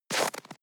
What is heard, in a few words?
step snow walk single